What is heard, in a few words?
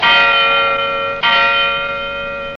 bell toll medium